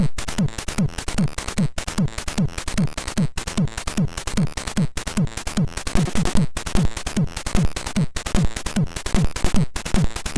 House, sid MOS6581R2

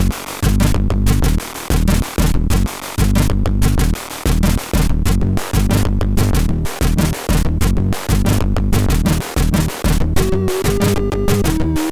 Techno, sid MOS6581R4; Back 2 Techno; hvsc

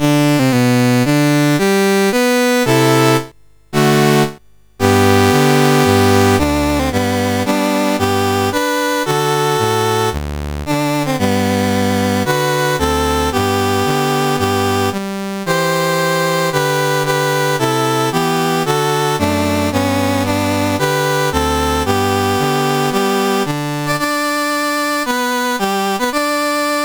Dahomey, National Anthems; CSG8580R5; Dave Marquis

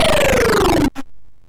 sid MOS6581R2, GAMES; Catalypse

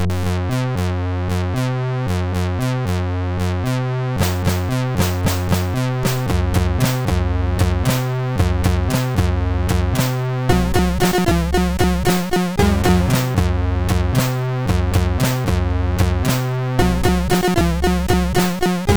Happy tune, sid MOS6581R2

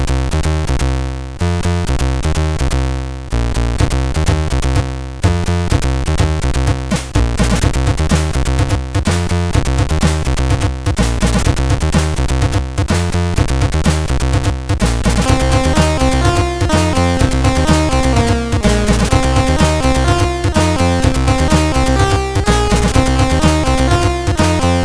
Heavy Steel sid CSG8580R5

